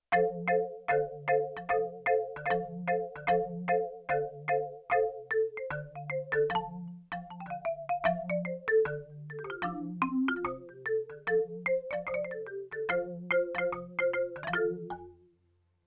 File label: Chorus-Vibrato, Marimba